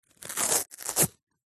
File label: foley card toilet roll rip small section; zapsplat